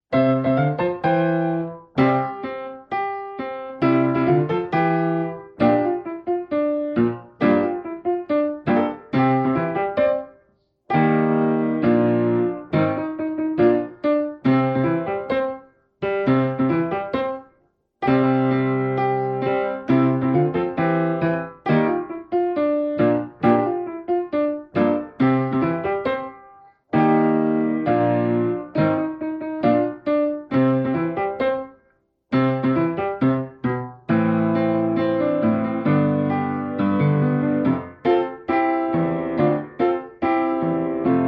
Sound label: raining instrumental